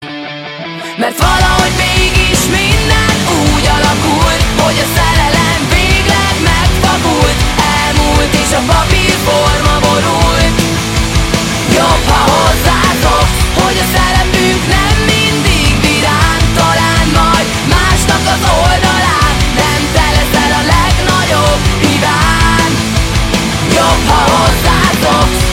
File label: Is Calling Rock